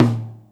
tom, Drums Acoustic; USB Soundscan vol.10; AKAI; 90s sample CD; DYNAKIT 3

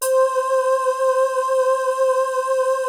PERU LEAD C4; Music Store - Claudius Diemeter Schwerlos; FINE ART 3

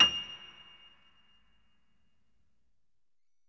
Acoustic Piano

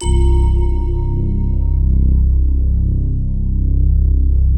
BELL 3 C1; Music Store - Claudius Diemeter Schwerlos; FINE ART 5

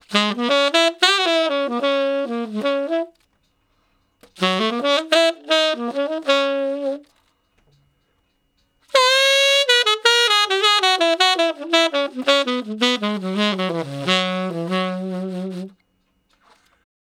Sax Slo Blus (Db I, IV, I, I, IV) pickup; Ten Sax Slo Blus (Db); Solos; Crimson Blue and Fabulous Horncraft 4 RnB; Horncraft for R&B

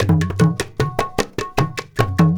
INDIA, IND ENS; Spectrasonics; Heart of Asia

